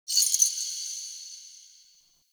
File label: Fortress Chains Small; Warframe; Grineer Fortress